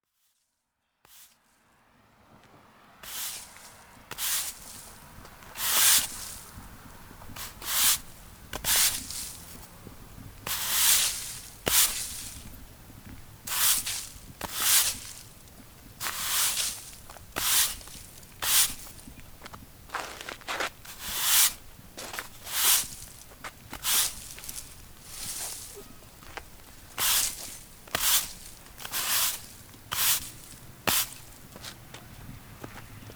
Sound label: sweeping the front walk